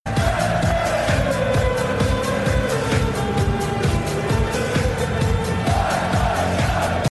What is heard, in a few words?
dart; cstrike